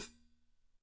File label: HH Pedal